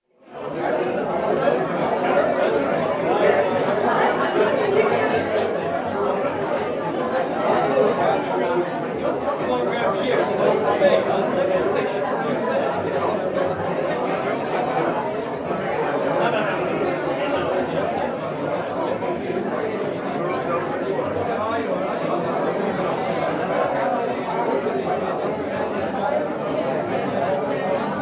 Dinner-Dance with large crowd (100-plus) in hall (Narrow stereo image); Crowds Interior; BBC